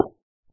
stone, dig; Minecraft

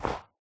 snow, step; Minecraft

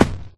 fall small; Minecraft

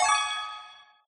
gold point; Minecraft

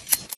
shear, sheep; mob; Minecraft

